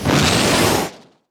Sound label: Jet Pack Start